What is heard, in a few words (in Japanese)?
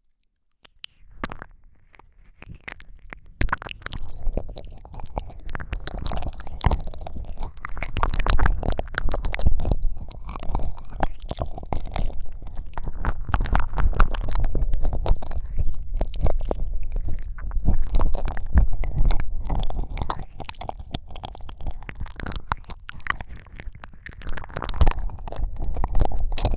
両耳同時に奥責め耳舐め